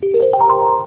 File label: gong; rozklad; zapowiedzi